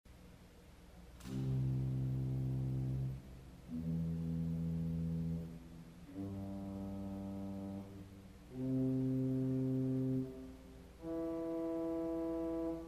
Viole de Gambe Arpeg, organ; CulverAcademy

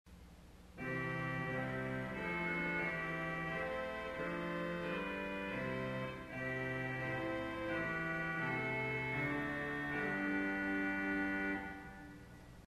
Vox Humana St Anne, organ; CulverAcademy

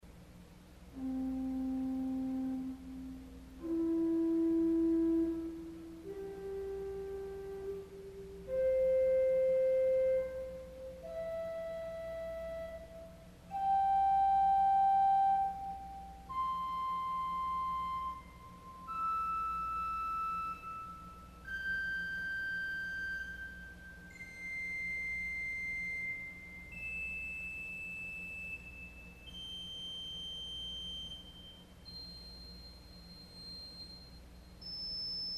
Zauberflote Arpeg, organ; CulverAcademy

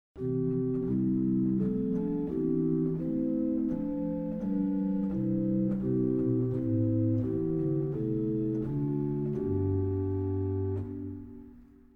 Bourdon; CavColMainz

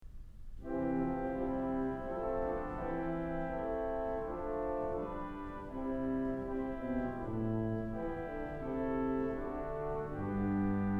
Gamba, organ stops; Kellogg Auditorium

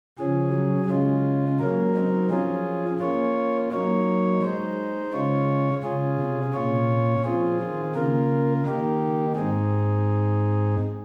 Principal 8, organ stops; Reinhardtsgrimma

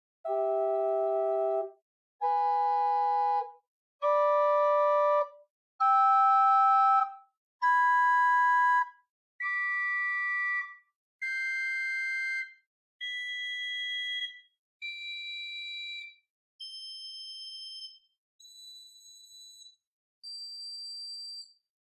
Sesquialtera, organ; Buzard Opus 3

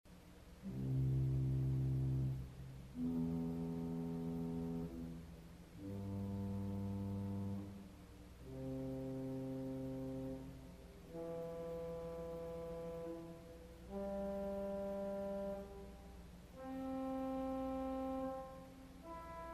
Viole Celeste Arpeg, organ; CulverAcademy

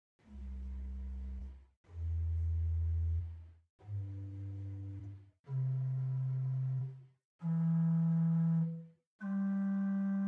Gedackt 8 arp, organ stops; Grace Lutheran, Champaign

